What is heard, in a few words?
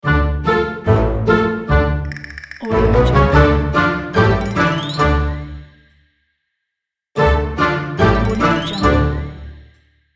funny intro ident